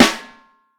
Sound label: Vintage Snare Drum